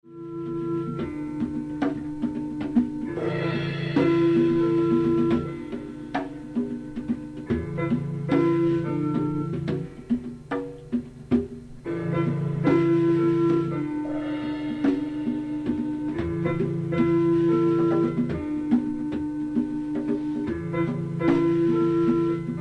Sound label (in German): Keyboard und Congas